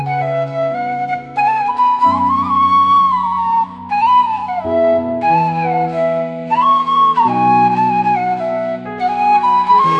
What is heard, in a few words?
flute; musiclm